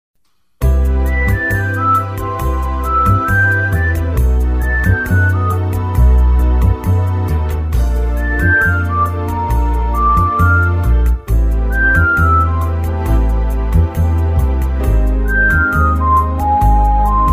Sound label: Bossa Parisienne